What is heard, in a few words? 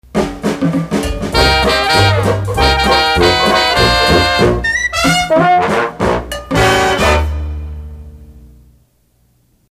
Dixieland Instrumental; PAMS; WDGY